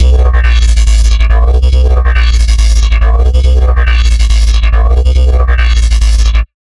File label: Bassline C3 140, Dubstep; GhostHack